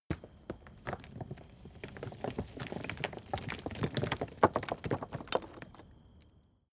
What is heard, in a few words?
stone medium fall long